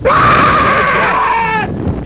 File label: Scream